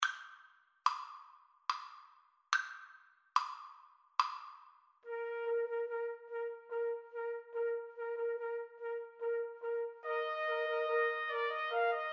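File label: bolero trumpet, karaoke; Trumpet Trio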